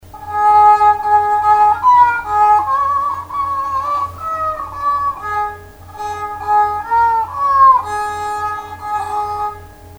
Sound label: Sample Erhu Impro